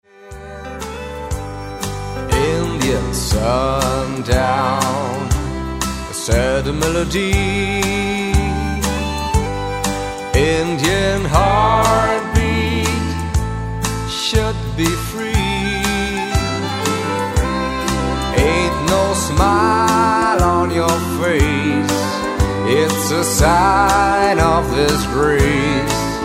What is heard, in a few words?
heartbeat; indian